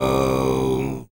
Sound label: TUVAN LOW, World Voices; Spectrasonics Vocal Planet CD5